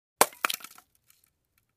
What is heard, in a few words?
Small Instamatic Flash